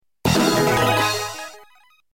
Music High Scores; Super Street Fighter II

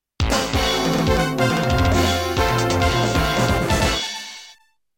Music Victory; Super Street Fighter II

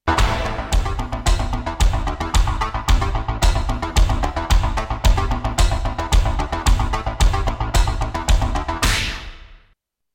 music continue

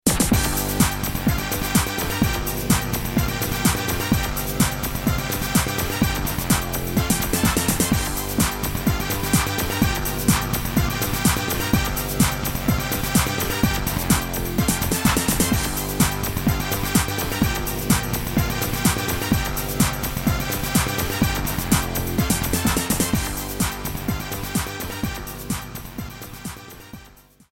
music high scores

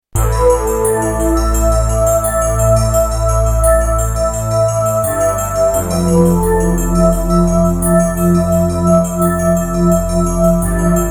music game over

